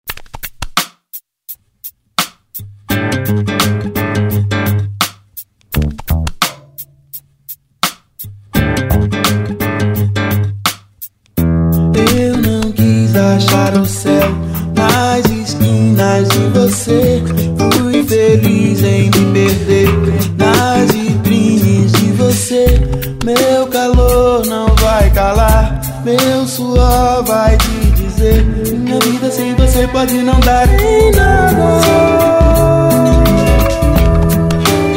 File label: Baby Groove